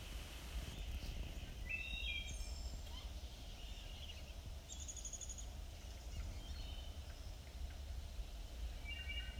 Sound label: Mystery bird call